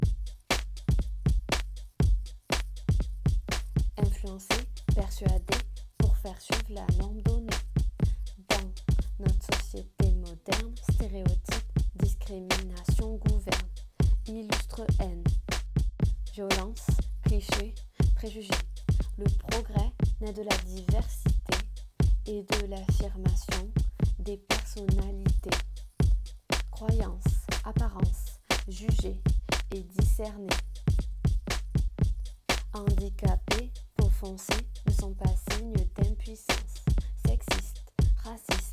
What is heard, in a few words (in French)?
RAP